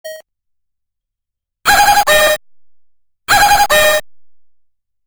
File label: Bird sound